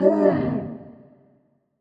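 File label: Damage; Trigger Finger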